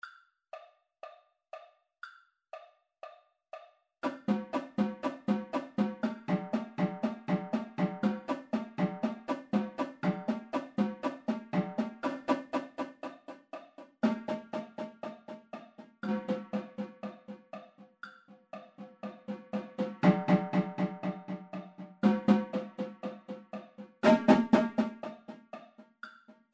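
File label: 8 On A Hand 120 bpm Tenor, tenor drums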